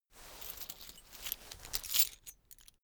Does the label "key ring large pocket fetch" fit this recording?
Yes